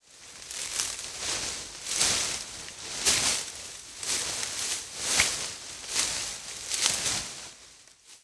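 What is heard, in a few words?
Footsteps Walking thru heavy leaves; Fairlight Sound Lib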